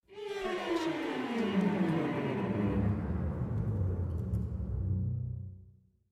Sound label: long gliss down